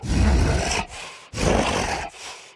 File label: Walk Cycle Burns; Devour; The Town